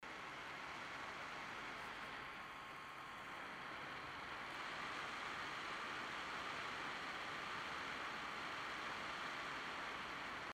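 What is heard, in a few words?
noise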